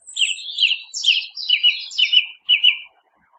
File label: song thrush song